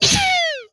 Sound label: Sci Fi Gun, Blast; Space Blasters2D